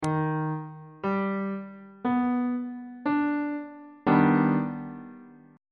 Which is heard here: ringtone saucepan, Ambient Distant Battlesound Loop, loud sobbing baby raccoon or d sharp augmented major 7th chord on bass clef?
d sharp augmented major 7th chord on bass clef